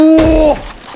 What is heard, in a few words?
wooo